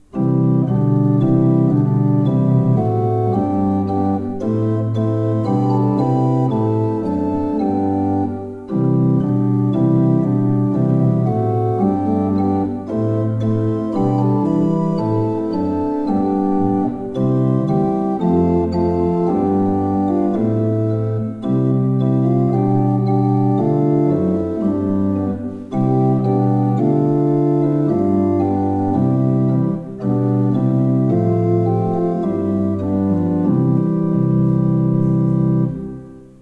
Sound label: pipe organs; Steinmann